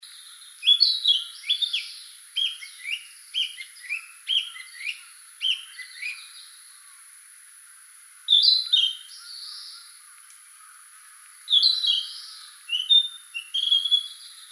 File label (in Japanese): kibitaki kojukei